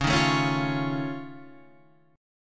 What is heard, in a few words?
F Augmented 7th Db, chord